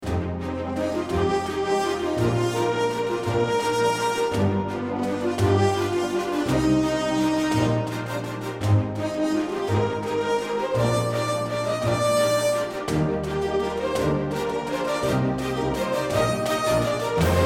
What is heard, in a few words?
fanfare; rook